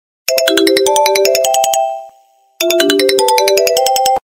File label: Sony Ericsson